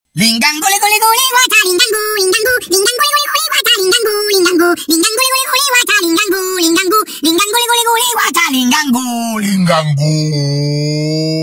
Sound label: lingan guli guli loud